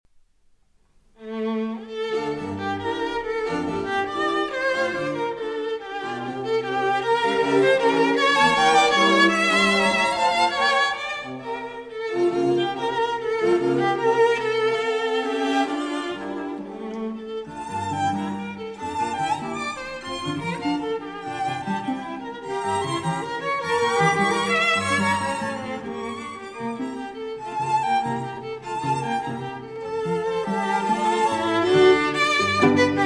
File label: String Quartet in B Flat Major; Brahms